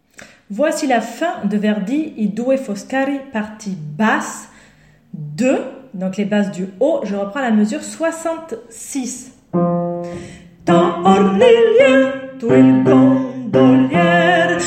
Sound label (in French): basse du haut